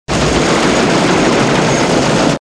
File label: Huey Chainguns; Airwolf